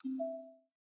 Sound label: Charge, notifications; Yota Yotaphone-2